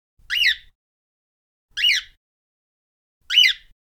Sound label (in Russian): kakadu